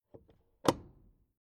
Industrial Small Electrical Lever Switch On Metallic Impact